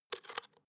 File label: spice jar adjust fast